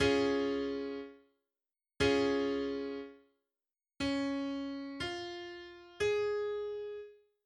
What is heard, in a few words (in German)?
dreiklang; Musik; Realschule